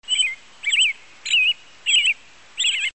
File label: bird tweet sms tone